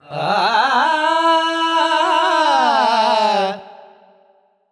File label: Vocals Male World Pop; Sonic Foundry (Sony Creative Software)